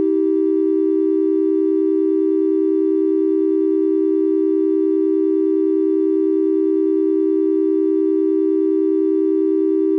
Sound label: seven equal III